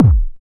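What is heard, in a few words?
Cool bass drum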